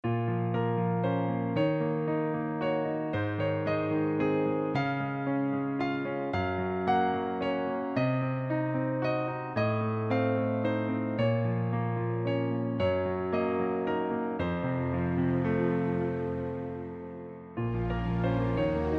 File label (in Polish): WALC b moll